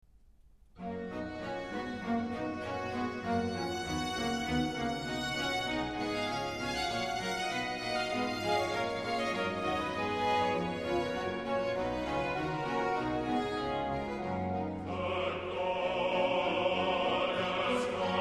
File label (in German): tedeum Chor